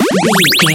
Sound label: retro magic spell cast